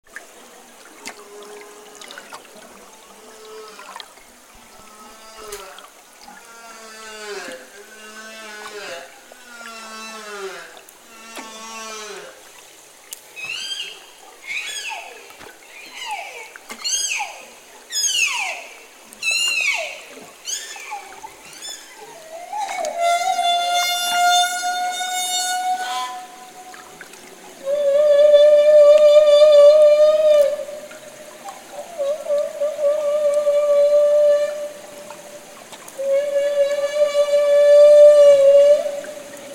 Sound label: Humpback Bubblenet